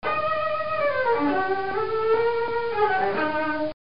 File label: cello